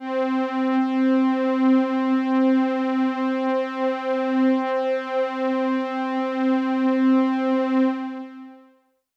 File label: Pad C, Synth Prophet One Shots; Electronisounds